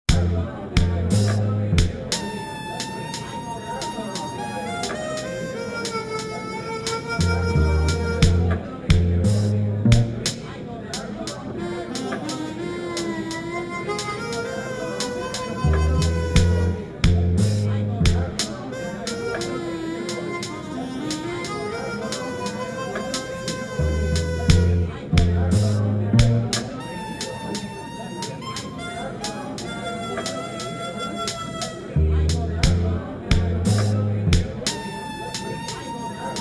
pub; Riglord Saga

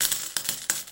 Coins

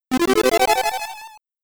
Round Complete; Fuzzball; Amiga

